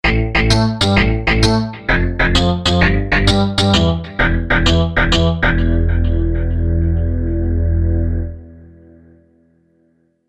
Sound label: AMBIKA 48kHz Single Sample Delay; EIII